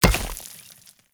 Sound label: Hammer Impact Dirt; Weapon Impacts; Player; Environment; Tuvalkane Hammer